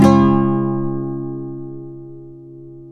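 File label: nylon guitar down major D, flam chords; Spectrasonics; Hans Zimmer Guitars VOL-2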